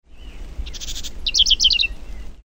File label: whinchat